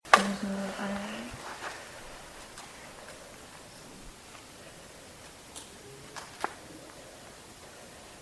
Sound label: After midnight lady singing